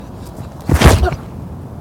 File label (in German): Rutschen